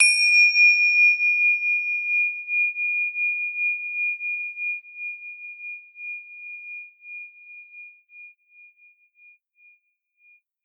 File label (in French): cloche tibetaine